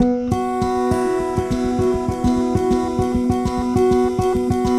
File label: Guitar And Synth